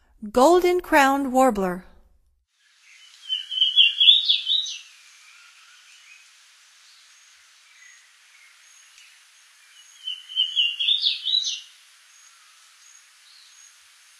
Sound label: Golden-crowned Warbler, Songs of Birds of North America; Stokes